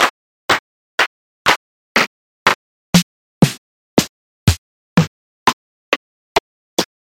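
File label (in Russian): барабанный набор с хлопками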